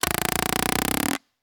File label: Paintball Gun; Sound Ideas; Guns